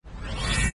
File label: transition; avengers